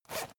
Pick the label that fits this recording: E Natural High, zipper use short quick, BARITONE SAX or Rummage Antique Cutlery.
zipper use short quick